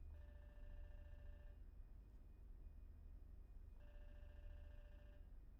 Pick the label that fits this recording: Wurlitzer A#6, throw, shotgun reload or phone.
phone